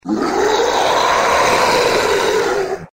monstro

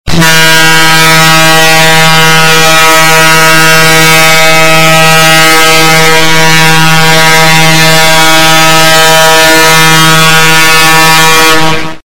buzina alta de trem

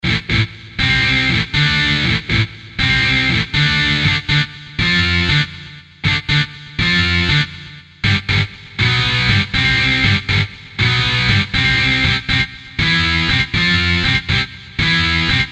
solo guitarra

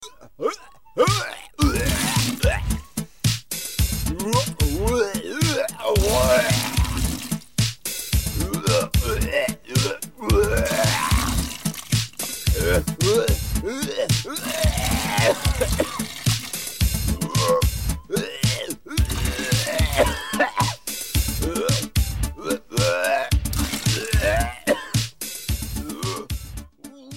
musica do vomito

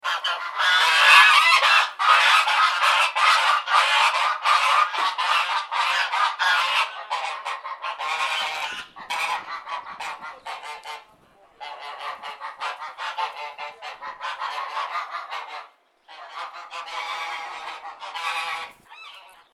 patos efeito sonoro

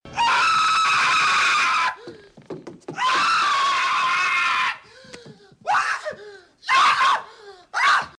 gritos de terror; toques para celular

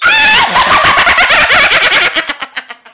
bruxa rindo, risadas de bruxa

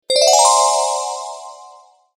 magico

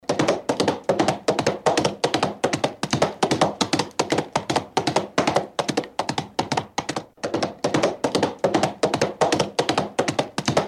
cavalo galopando

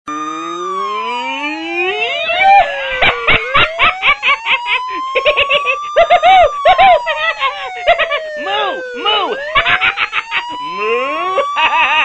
homem rindo alto; toques para celular

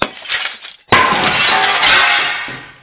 vidro quebrando

